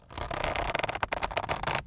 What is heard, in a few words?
leather stretch; archery